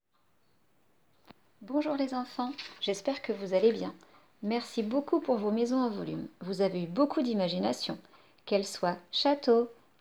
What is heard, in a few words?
Vocal